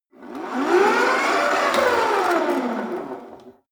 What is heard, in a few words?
rope trainer pull sequence medium short, fitnessstudio; sport freizeit